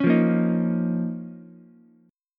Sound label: Eb 7th Suspended 2nd Eb, Ukulele; Eb7sus2 strummed; JGuitar